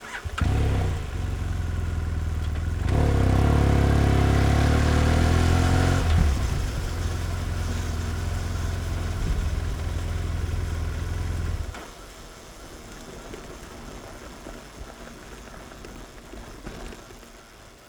BMW F800R ONBOARD Driving Sequence Wheels Rolling With Engine Off, 4-Stroke 798cc; 2010; Dramatic Cat